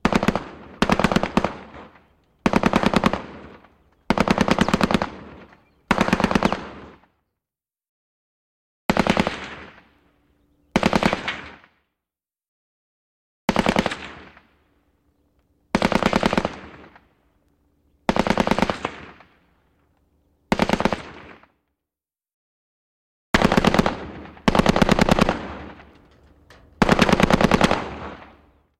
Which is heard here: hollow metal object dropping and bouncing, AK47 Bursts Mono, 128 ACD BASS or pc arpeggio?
AK47 Bursts Mono